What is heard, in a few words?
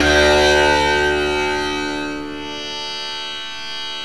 TAM SIT SAR